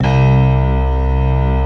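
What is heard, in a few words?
bright electric piano strings; Optical Media International - Sonic Images Library; Slopiastring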